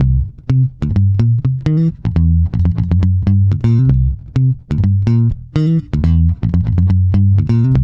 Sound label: BOOG C, Bass, BOOGALOO; Spectrasonics; Bass Legends